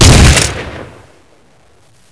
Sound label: fire, weapons; jack